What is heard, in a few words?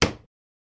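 Hammer Nail Wood Single; hammers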